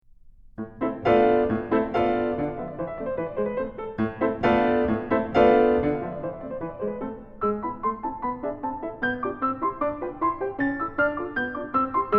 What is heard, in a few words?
Fluttering Leaves In A Minor; Magrath Book 07